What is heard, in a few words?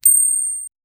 sound of collectiong cart, collection